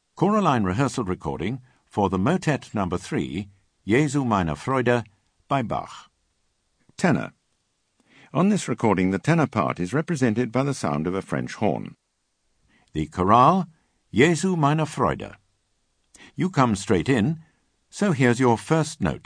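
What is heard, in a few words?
Tenor; Bach Motets 3&6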